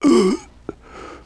citizen beaten, voices; Half Life Model Pack; Half Life 2

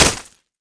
knife hit wall; weapons; Counter Strike Model Pack; CS:GO

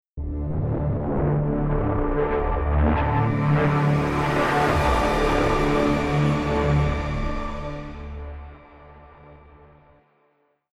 lost round, music; halflife alyx

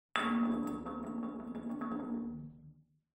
glass settle; ambient; amb oneshot

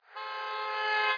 car honk

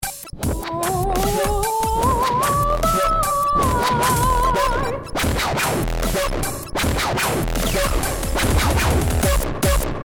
round ten sec count, music

